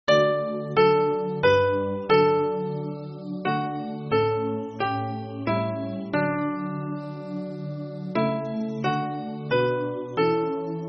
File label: hymn, soprano